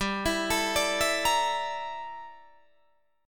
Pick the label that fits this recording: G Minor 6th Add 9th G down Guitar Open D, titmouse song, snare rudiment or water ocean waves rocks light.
G Minor 6th Add 9th G down Guitar Open D